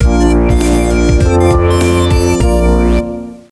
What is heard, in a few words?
Win